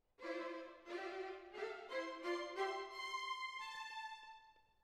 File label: Cello Solo